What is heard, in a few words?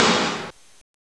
Drum